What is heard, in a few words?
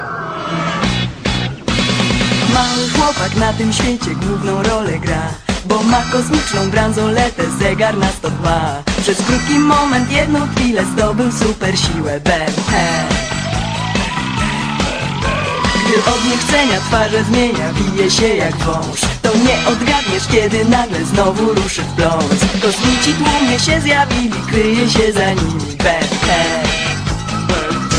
Rock